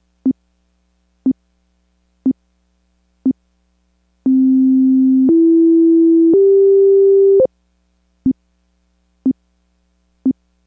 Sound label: gps clock sound minutes